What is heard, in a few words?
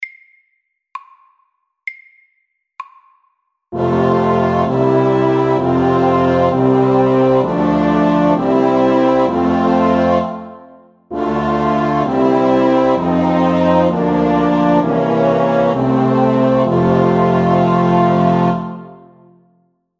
Chromatic chorale FORT